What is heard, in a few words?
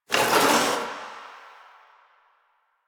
Box Of Trash Rustle; Ambience; Warframe; Nineteen Ninety Nine